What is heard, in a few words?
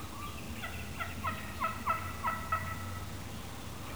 tree yelp, Turkey Sounds